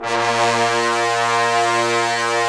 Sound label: BRS BNSF28L, Roland LA Composer, Tbns; 90 s Sample CDs